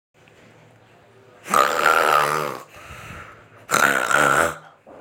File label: super loud snoring